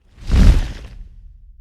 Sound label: sound Manda Wing Flap; freedom fall